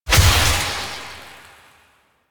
Projectile Death Medium; Duviri; Incarnon Adapters

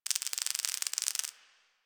Night Insect Click; Ambience; One Offs; Insects; Warframe; Quests; The New War; Drifter Camp

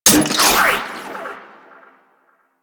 Harpoon Gun Charged Mech; Warframe; Sharkwing; Grineer

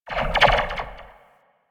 Zipline Random Slow; Gadgets; Parkour; Warframe

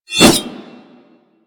Tonfa Whoosh; Warframe; Tenno

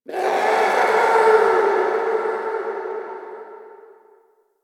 interior texture painful screech; Ambience; Misc Indoor; DE; Warframe; Infested Eris

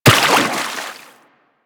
Run Water, Footsteps; Warframe; Operator

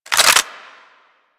Lex Clip In

